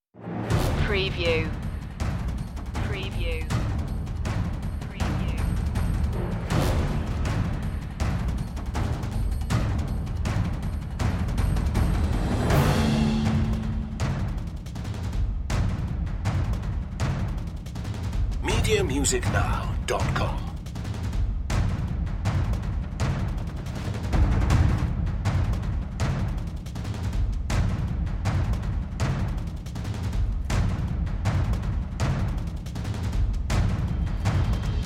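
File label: percussion mix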